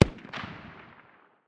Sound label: dmr far distance, 7.62x51mm; tacz-tweak-pack; taczmechanics